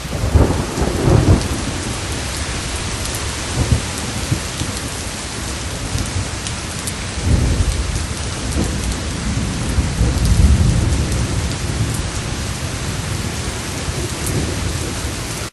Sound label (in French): Orage roulement Pluie moyenne; Orages